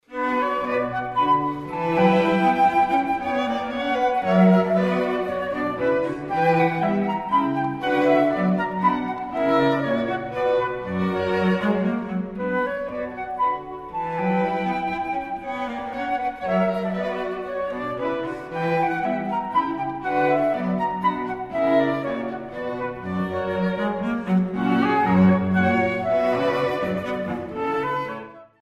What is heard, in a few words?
menuet, string quartet; bach